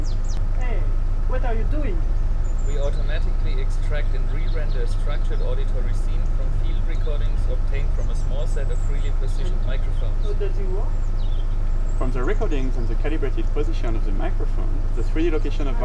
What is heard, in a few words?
mono Outside